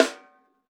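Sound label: snare drum, Drums Acoustic; USB Soundscan vol.10; AKAI; RAW KIT 9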